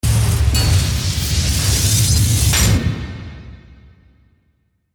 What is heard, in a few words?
Industrial Stinger Assembly Metallic Hits Electrical Arcs; Production Element Imaging Element Accent Transition; Blastwave; Stinger Sound Effects